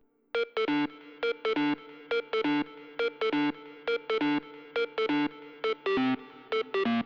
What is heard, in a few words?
136 Lead; Zero-G Trance Inducer; Construction Kits; 136bpm